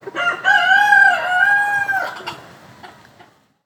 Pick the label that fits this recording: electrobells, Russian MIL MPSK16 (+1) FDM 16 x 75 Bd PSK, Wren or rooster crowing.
rooster crowing